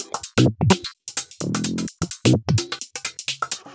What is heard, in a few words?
Minimal Loops